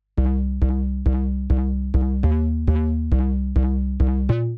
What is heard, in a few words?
Bass; simple session